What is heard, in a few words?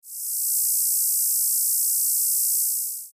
Hand Held Laser Saw, lasers